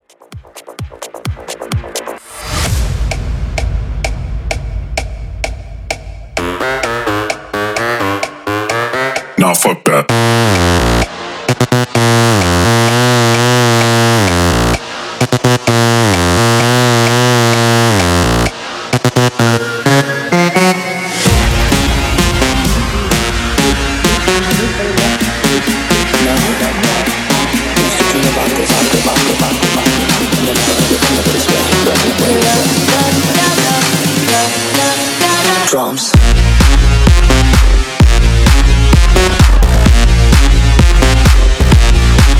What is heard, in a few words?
Mashup Toneplay Quick Troll 129 BPM